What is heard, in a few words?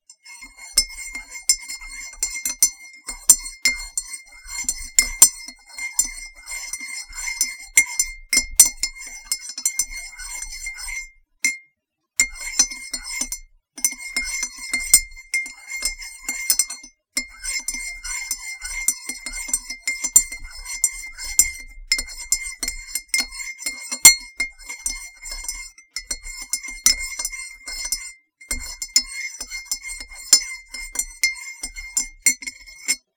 Spoon In Cup